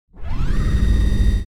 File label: Servo burst robotic mechanical movement